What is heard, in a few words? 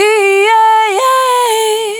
Urban Dry, Single Voice Melodic Loops